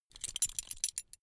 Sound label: metal belt buckle clatter long heavy